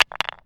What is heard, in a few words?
Stone Dropping